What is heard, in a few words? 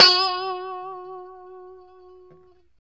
POP F#4, STRAT guitar; Spectrasonics; Hans Zimmer Guitars VOL-1; JC-STRAT POP